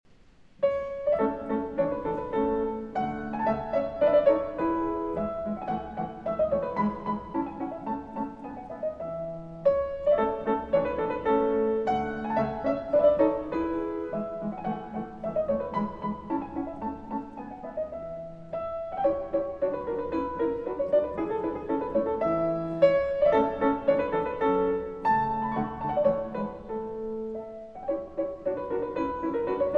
Keyboard Sonata in C Sharp Minor

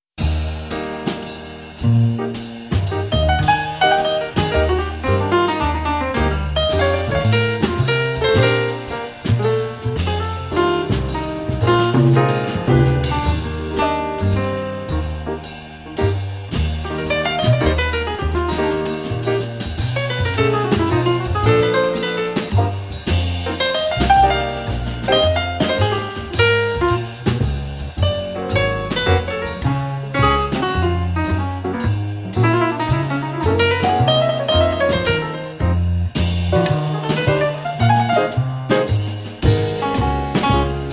In E-Flat Major, Jazz Ensemble

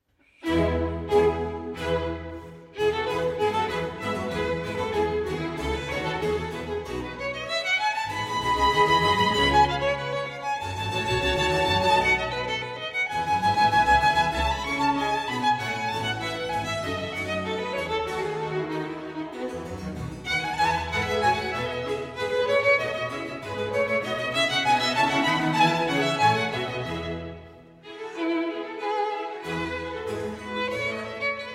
Violin Concerto in E Major, Allegro

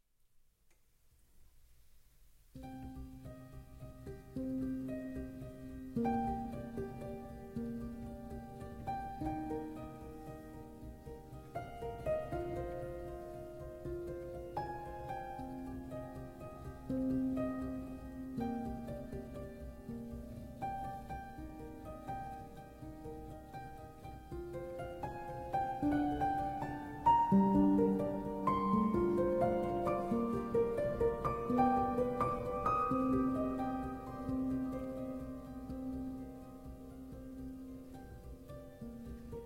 Piano

